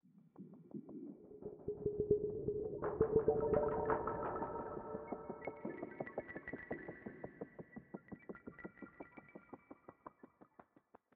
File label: Basic Low Beat 85bpm; Legends of Pegasus; windows gamerip 2012